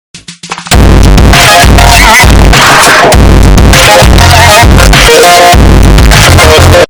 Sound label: default dance bass boost; fortnite